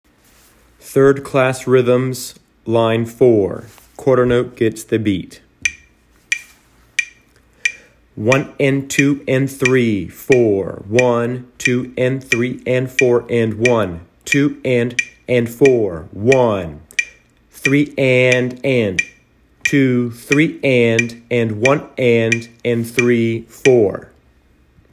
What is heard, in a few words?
rhythms